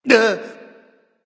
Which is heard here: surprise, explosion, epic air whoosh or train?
surprise